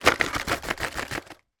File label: food candy lozenges packet shake candy into hand; fishermans friends; zapsplat